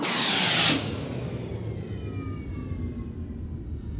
Puff

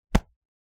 Fist Shoulder

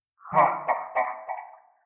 Demon Laugh

